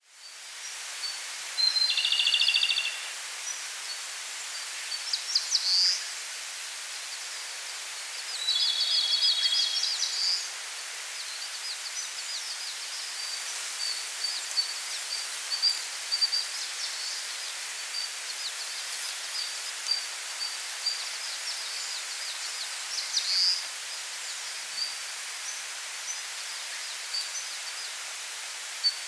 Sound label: stream with robins